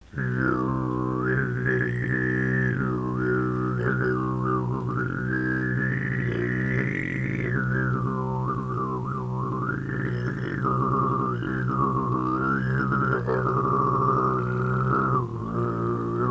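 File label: sygyt, throat singing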